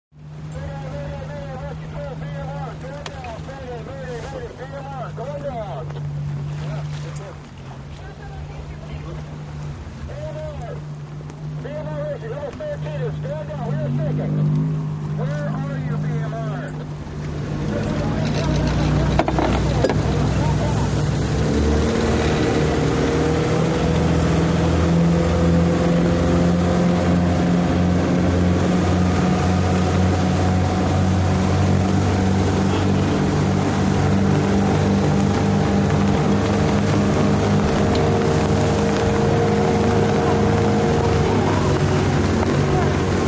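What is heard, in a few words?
Power Boat Race Ambience, off shore power boat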